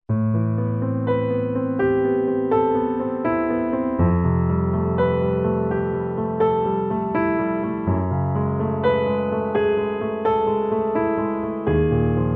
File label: Meditation